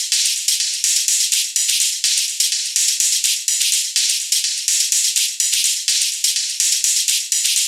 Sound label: Top Loop Bbm 125bpm